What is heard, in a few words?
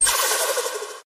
Blow Off Valve Supra